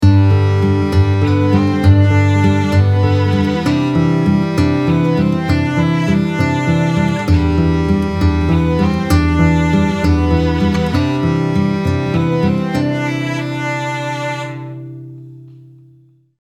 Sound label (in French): Guitare classique